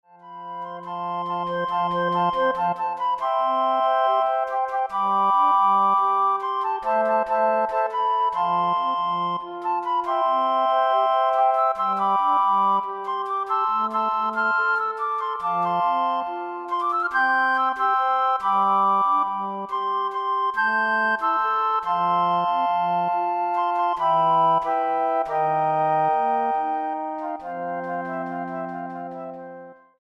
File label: Flute Ensemble